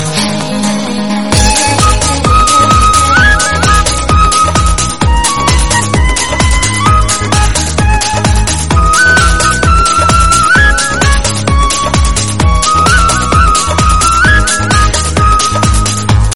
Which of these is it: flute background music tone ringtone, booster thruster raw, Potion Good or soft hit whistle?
flute background music tone ringtone